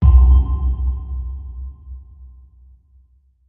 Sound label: Bass Impact Tonal So; impacts